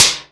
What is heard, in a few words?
PLATE, GM drum set; AKAI S-Series CD-ROM Sound Library VOL-8